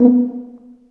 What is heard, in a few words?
TUBA C1, Solo Brass, stacc; Roland LCDP12